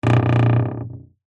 household door creak wooden dull

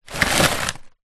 food popcorn paper bag open full pick up

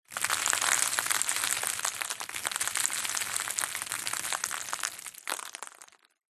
foley debris dust dirt land noisy surface

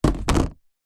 tub plastic lid set down on tub; foley

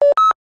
technology CB radio two way beep

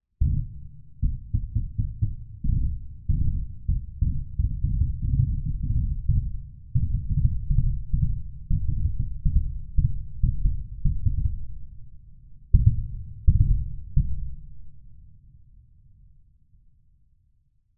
bombs very distant cluster of explosions

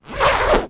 household cushion zip; zapsplat

